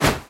move throw; scripted sequences, a1, safehouse

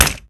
weapon hegrenade impact; physics; weapons

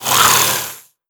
yawn, headcrab, creatures; headcrab classic; vox

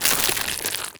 zombie move, foley; creatures; Half Life Model Pack

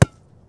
ball impact, rubber; physics

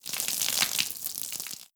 vomit splash drip layer; Half Life Alyx

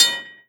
train crossing bell; world misc

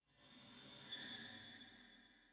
metal; Counter Strike Model Pack; CS:S; ambient misc

